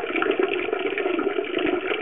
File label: piss